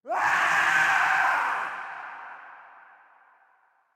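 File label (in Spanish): Gritos, screams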